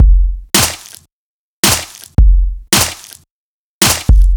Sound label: Sick Snare, soul